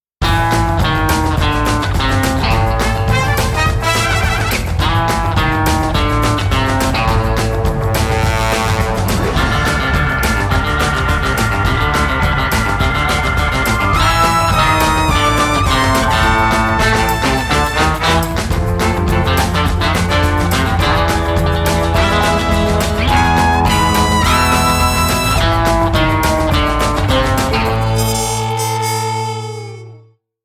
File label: end theme instrumental; pbskids; go studio